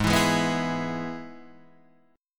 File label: E Major Ab